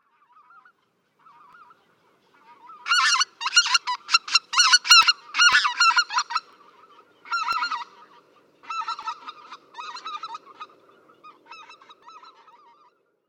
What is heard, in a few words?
Greater White fronted Goose